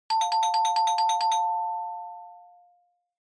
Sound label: Quiz Ding Dong Long